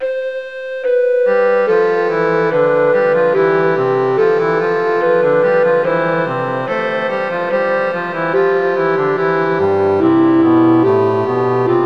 vom himmel hoch da komm ich her orgel

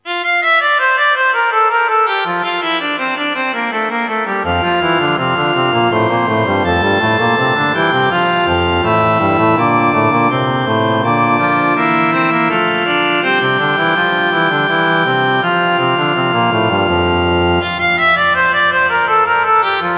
lobe den herren, orgel

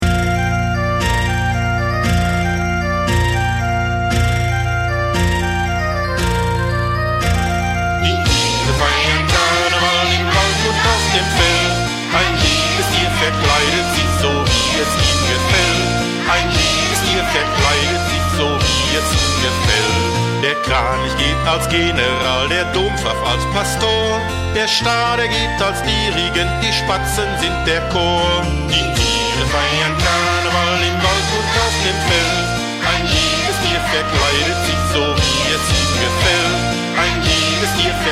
kinderlieder vocal

